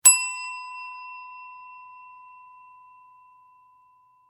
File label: Bell Ring Desk, Music, Bell, Ring, Desk; Bells; Musical